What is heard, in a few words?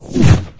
scanner near miss; Source Engine; video game; npc